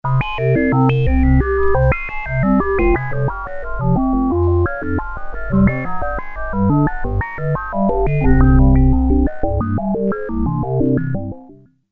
resonance; moss

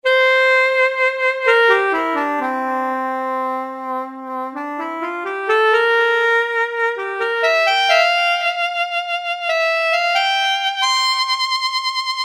v-synth real sax

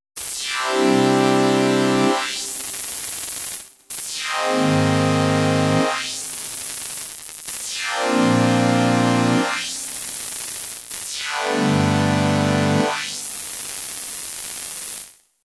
hpf, synth basic

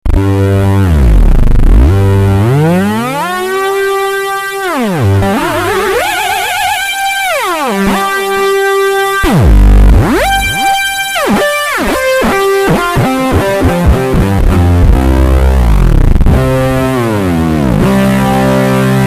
v-synth syn lead

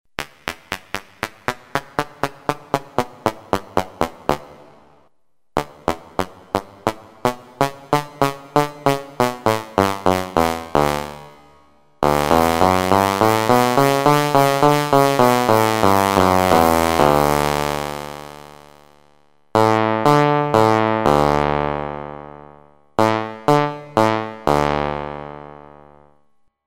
comb; moss

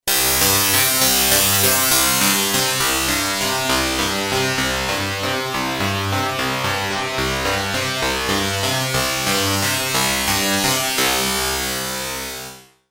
comb; moss

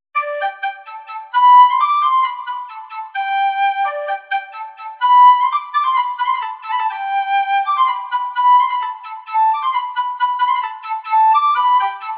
Quena Pipe, v-synth

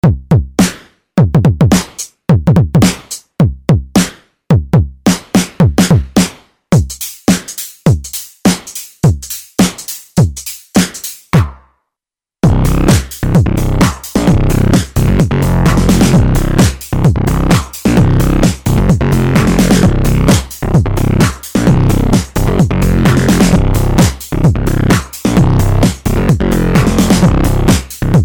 v-synth ana perc

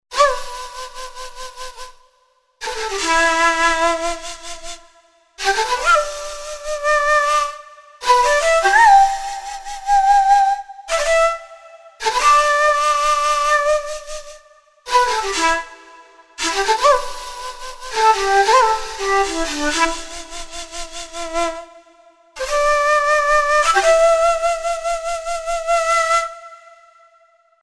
Shaku8, v-synth

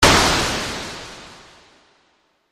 noize; v-synth

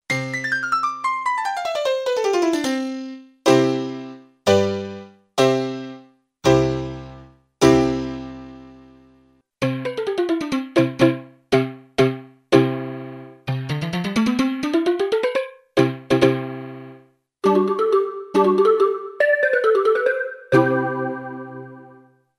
v-synth jd piano

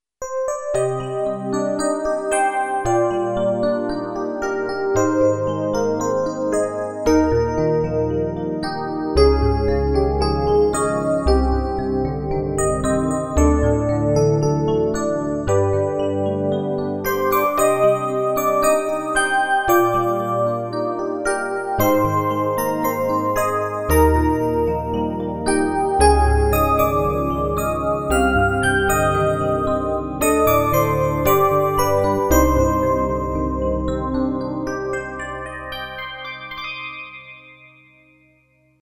fm epiano; moss

